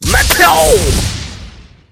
shoot; Meteo

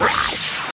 Plants attack

